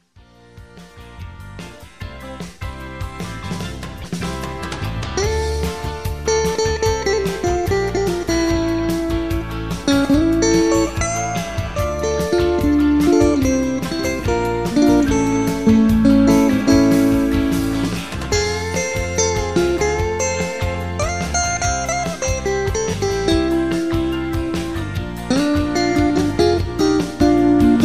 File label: Fender Strat